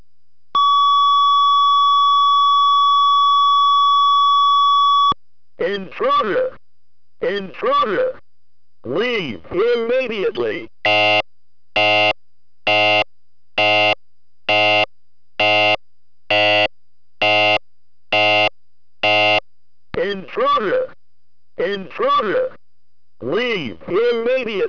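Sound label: Intruder Buzzer; ELK-110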